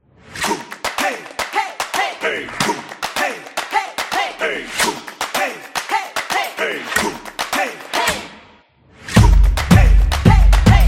Kitchen Background

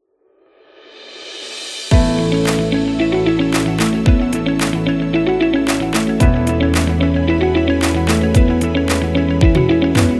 Upbeat Corporate Background; friendly

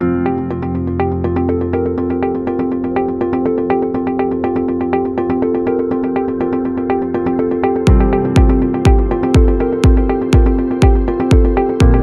Agency Promo; hi tech

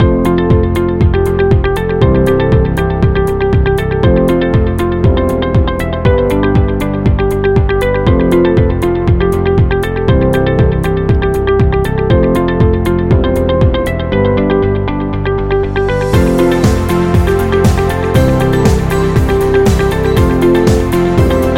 Learning; educative

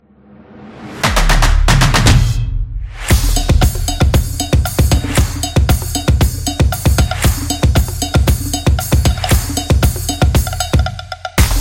percussion drum beat

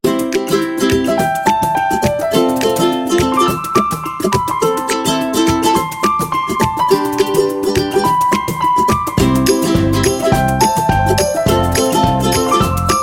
Positive Happy, ukulele

